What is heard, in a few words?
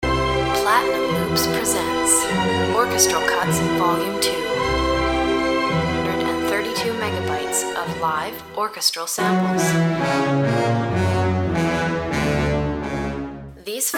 orchestral cuts